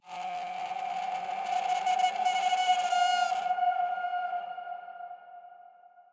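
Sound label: ambience bad creature screech; smite; conquest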